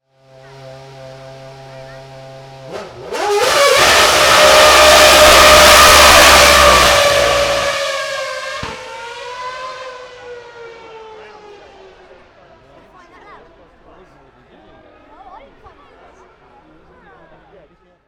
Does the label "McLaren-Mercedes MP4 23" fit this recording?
Yes